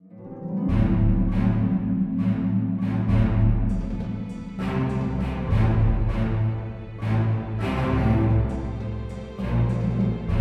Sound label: Galatic Starfighter Music; Star Wars The Old Republic Rise of the Hutt Cartels and Shadow of Revan